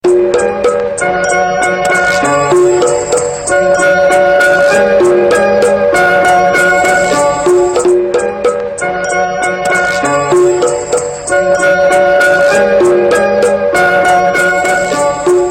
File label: Dark Suspense